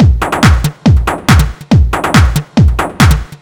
Cream, Techno Drums; TechnoMusicMaker

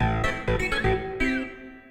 synth house 125 A; eJay; Keys

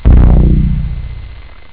juno, synth bass

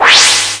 Transporter; Operation Diva 1.0

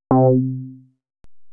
hit bass

